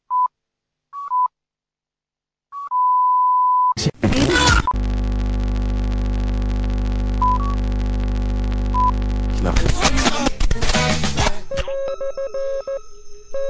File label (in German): jingle kurz; tesla